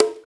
Conga; Equipped Music; Loungin House

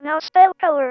Female vocals and robo-vox

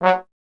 Trombone F#0; Miroslav Vitous - Solo Instruments VOL-1

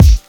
kick, ragga dub reggae; USB Soundscan vol.27; AKAI

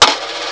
payout; ST-XX Amiga Soundtracker Sample Packs

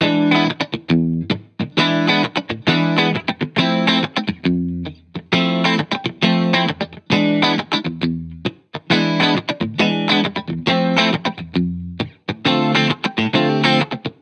Guitar, 135 bpm, key E7, Back to the 70s Guitars; Fox Samples; Full Mixes

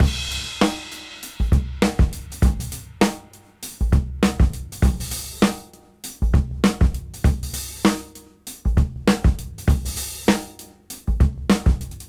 Swing 99 BPM; AMG - Keith LeBlanc Phat Butt Jams

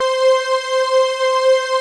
JUNO PADS; Pro-Rec - Synthsonic; JV PADS

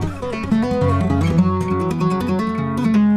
Slippery, Acoustic String; Sonic Foundry (Sony Creative Software); Friendly Fire

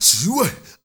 MALE SOLO, MALE NOISE, Heart of Africa

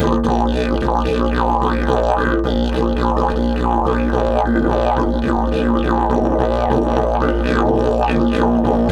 108, DIDGE 108BPM

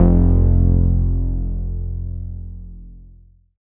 Bass, Synths, 5U Modular

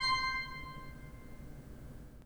PIPE, 3DOrgans

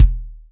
BDaftty, Yamaha DTXpress Acoustic Kick; drum machine

